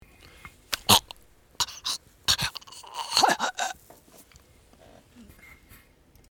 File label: choking